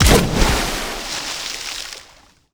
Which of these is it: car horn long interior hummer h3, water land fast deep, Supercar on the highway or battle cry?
water land fast deep